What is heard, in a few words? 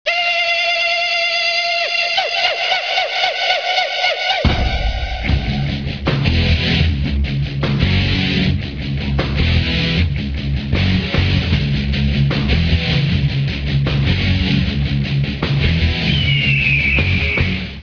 squak music; Tatanka; thanksgiving; NEW MUSIC COMPLETE WITH LOUD BIRD SQUAWK!!!